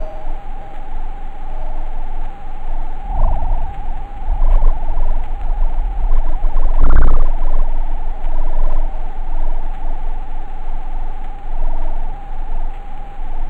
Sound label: Mexico M59 Audio VCO, seismometer; diy home seismometer station